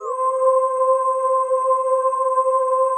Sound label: PAD, DIGI-PADS